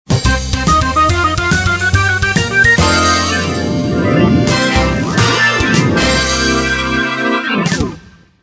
Success Jingle ~ Perfect; Neighbors from Hell; NFH

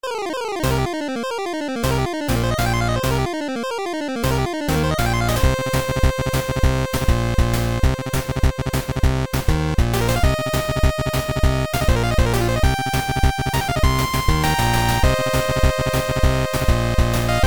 Doom Metal; Tiny Dice Dungeon; android game music; Dungeons Of Doom Metal

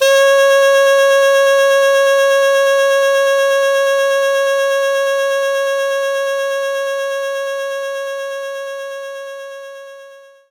Soprano Sax C2; knights try

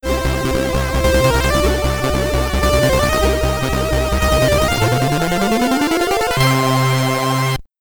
Ending, SID Stereo, commodore 64; Jocky Wilson's Darts Challenge

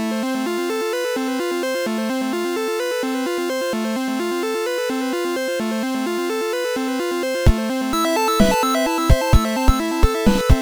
Mire Loop

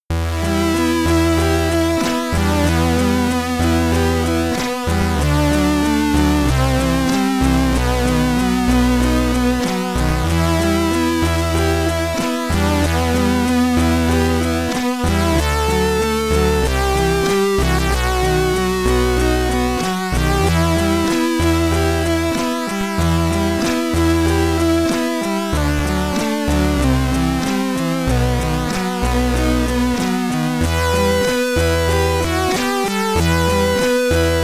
Name Entry, SID Stereo

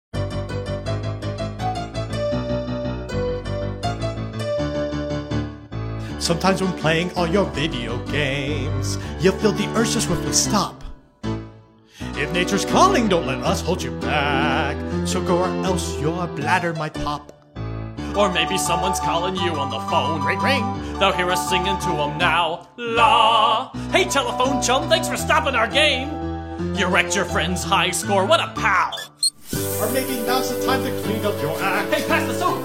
Pause Menu Song; Tadpole Treble

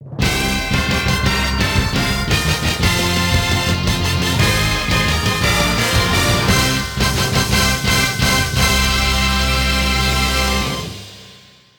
Game Cleared; mario sports superstars 2017 3ds gamerip; amiibo

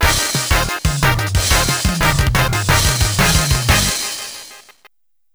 Victory!; Mobile Suit Gundam; arcade

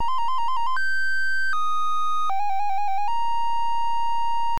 Flute; Heracles no Eikou II Titan no Metsubou; family computer; Paros

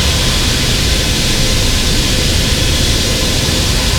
Loop Nitro Engine

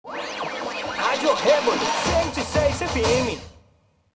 Radio Car Brazil Jingle; gangstar rio city of saints

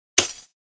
Hit; Dwarves Sulfur Alchemist

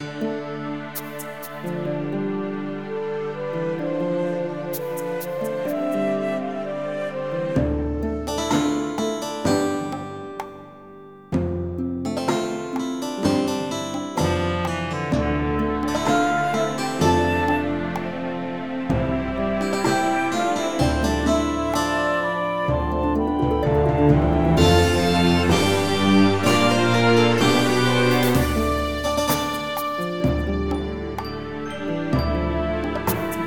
Midi Version; Kingdoms & Lords

